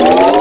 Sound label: Sound up; Funhouse of Love